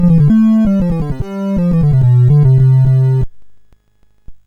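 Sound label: Pirati, MOS 6581 SID chip music, games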